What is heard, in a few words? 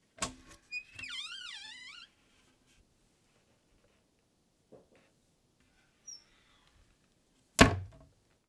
Doors and drawers Cabinet Drawer